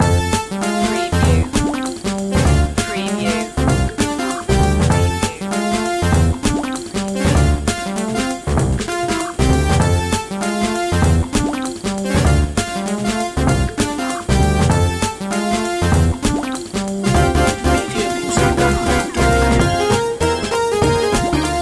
retro video game sweet action

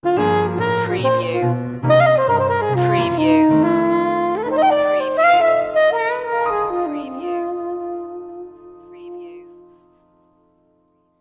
piano blues sting

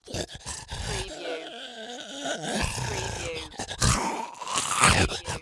CREATURE MOAN BREATH

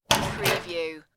open locker door

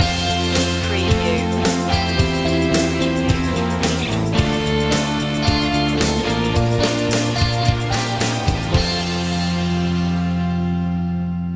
pop

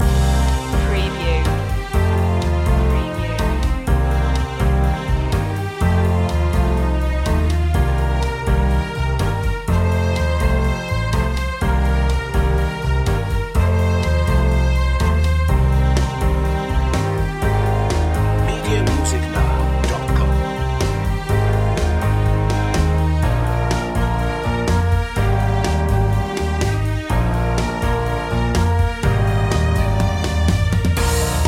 daytime stroll loop long

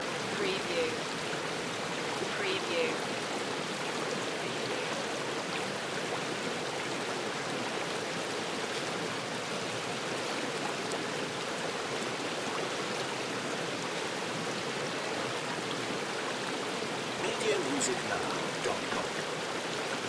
River or Stream Medium

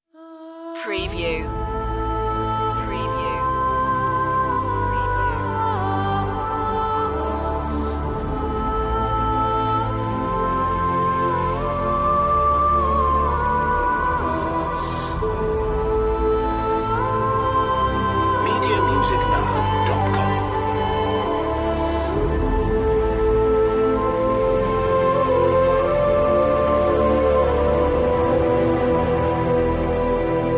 no choir no perc

